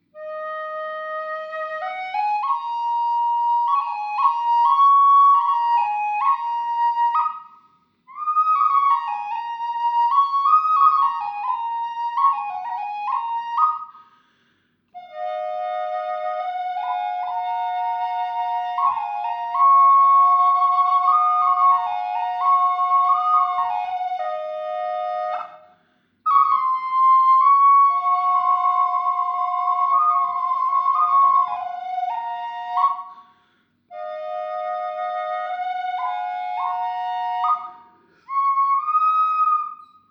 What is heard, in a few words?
Drone lakota in key of mi high, flute